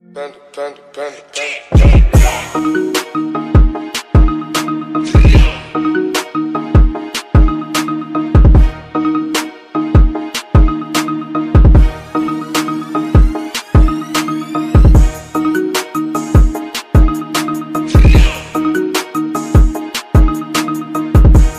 Marimba Remix

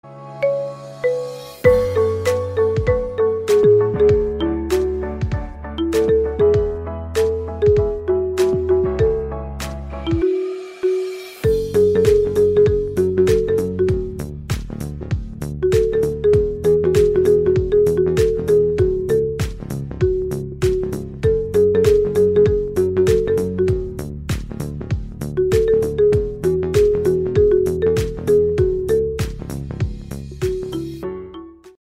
iPhone XS, tono de llamada

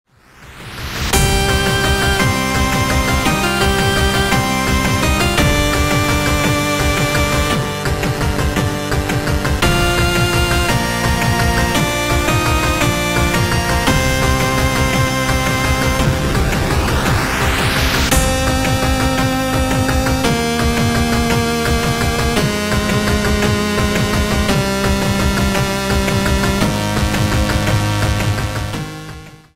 8 Bit

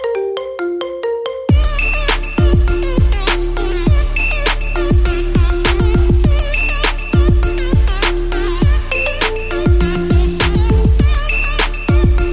iPhone 12 Max, tono de llamada

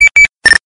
Radio Nextel

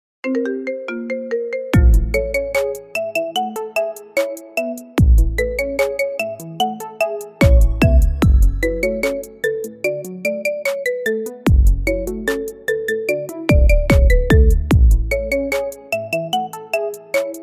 Intentions Marimba

